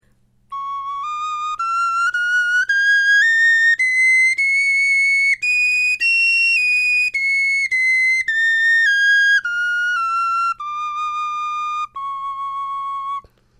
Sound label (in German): tonleiter, knochenfloeten